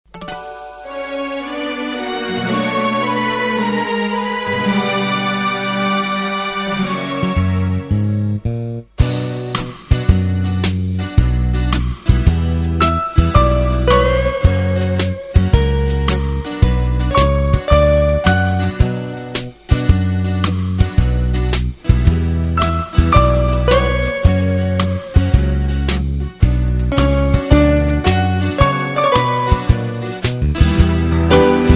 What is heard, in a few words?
brass minus